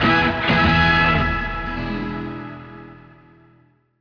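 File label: music sting finished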